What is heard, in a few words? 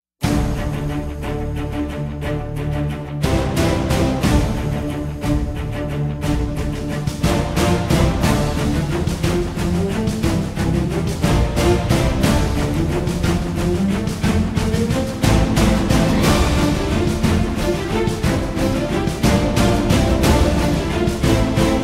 Epic Pirate Music; Brand X Music